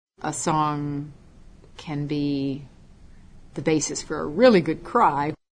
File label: Cry